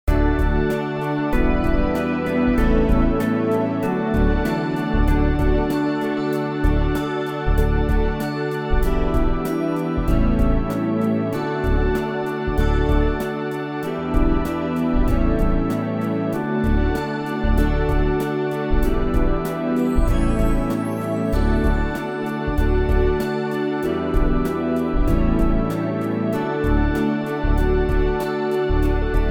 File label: Doxology Round (backing); New Hope Music